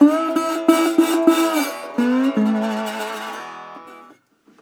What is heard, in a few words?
120, Blues Guitar; ILIO - Fretworks